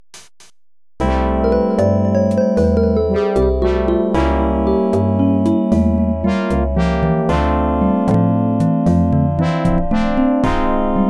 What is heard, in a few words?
Game Over Music